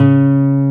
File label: G C2#, GUITAR; AKAI S-Series CD-ROM Sound Library VOL-8; SET#1 GUITAR